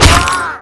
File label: crit hit; slender